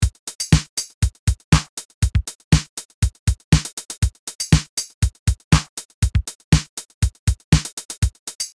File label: TR 707; Roland Cloud